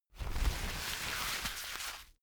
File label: paper towel roll sheet crumple